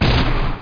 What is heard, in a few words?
shot; PC Gamer IT CD 35